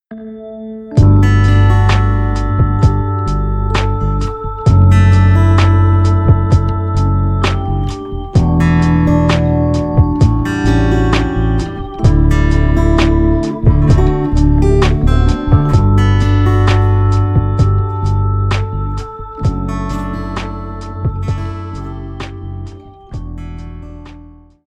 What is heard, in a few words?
Finger CPX, guitars; musical-instruments; el-ac-guitars